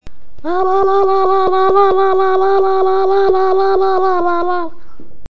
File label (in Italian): grande capo, devi fare "UUUU" e poi la mano davanti alla bocca